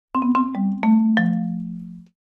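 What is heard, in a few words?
Marimba Nimble Accent; mallets marimba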